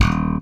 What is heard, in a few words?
PULL D#1, Bass; Sampleheads; Will Lee Bass Library; SPECTOR PULL